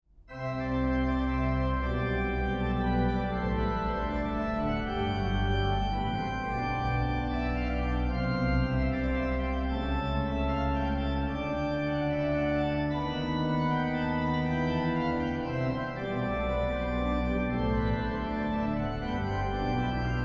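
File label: trio C major, organist; David Lasky; Trio in C major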